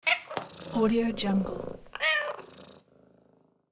cat purring meowing and scratching claws

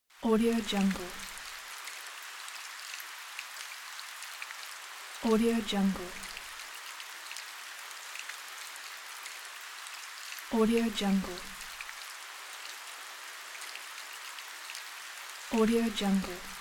light forest rain

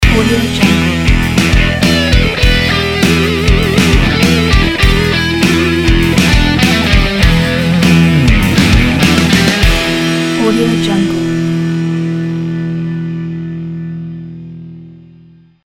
force logo

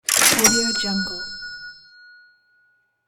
cash register kaching

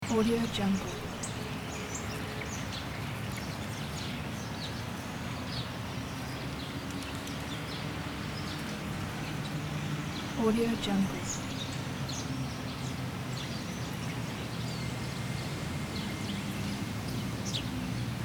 city park

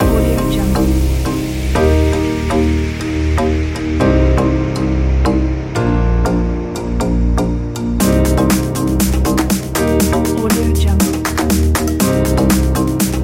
tropical summer house

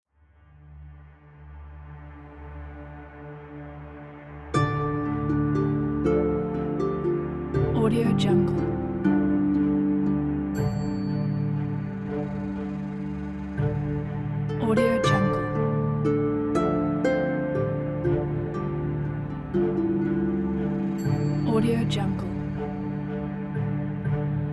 magic forest

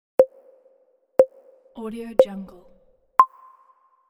game start countdown

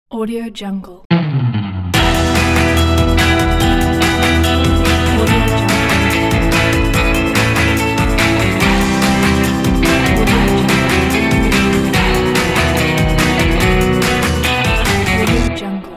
dusty surf rock logo

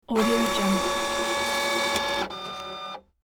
inkjet computer printer warms up

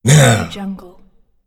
male anger

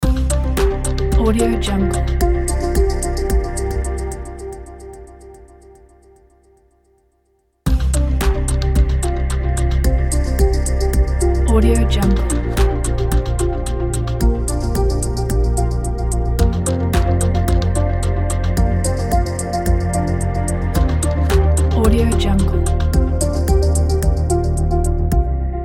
calm ambient logo ident

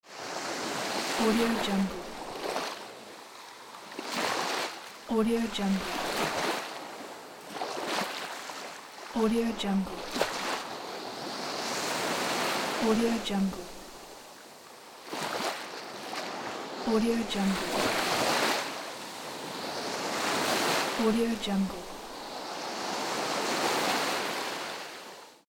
beach wave ambience

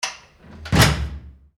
small metal ship hatch close

